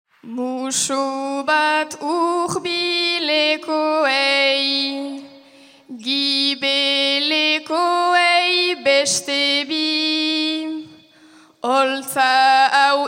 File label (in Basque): txapeldunaren agurra